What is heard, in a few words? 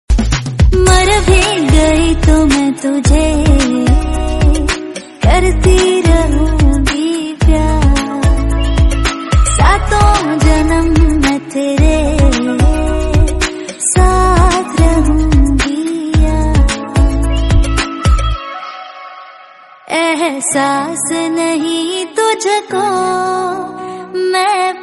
REMIX RINGTONE